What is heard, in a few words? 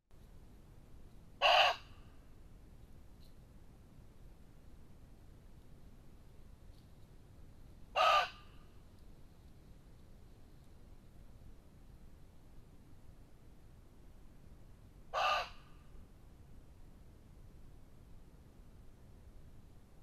Muntjac barks